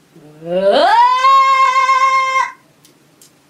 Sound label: Cat Yawn